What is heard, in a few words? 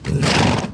snort